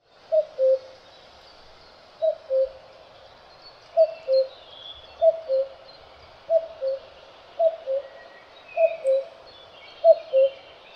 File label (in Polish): kukulka